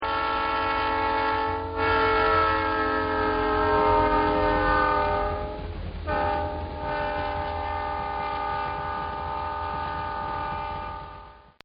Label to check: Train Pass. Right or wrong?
Right